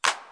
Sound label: clap 808; Claps; single hits